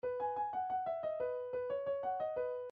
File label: telefon, midi